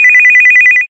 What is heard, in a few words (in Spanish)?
Generico alto, Ringtones; Tonos de llamada